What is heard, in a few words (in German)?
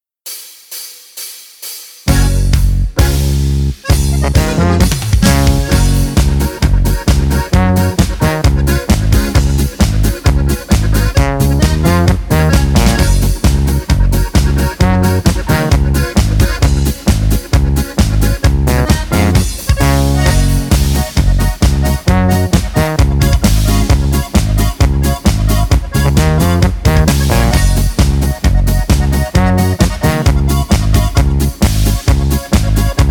Polka